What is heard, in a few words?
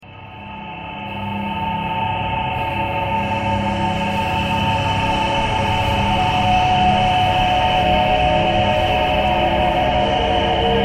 traffic core birds thread harmonic